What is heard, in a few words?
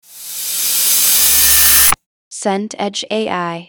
swell air